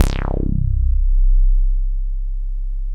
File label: BASS C2, JGL BASS; Explosive Jungle; USB Soundscan vol.05; AKAI